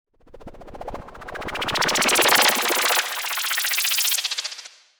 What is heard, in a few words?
Panned Noise Chopper